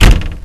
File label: Disconnected Sound; Companion